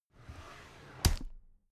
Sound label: drawer cabinet carton open regular